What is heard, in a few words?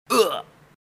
Wizard Dead